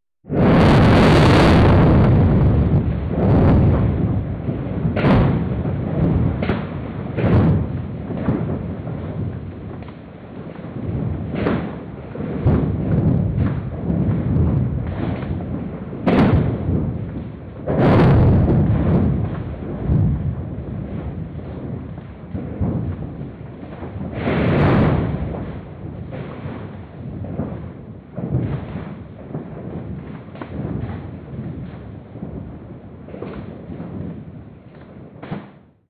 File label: Earth Japanese earthquake